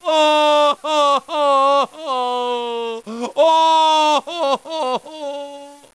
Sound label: Oh Oh Oh OOOOOHH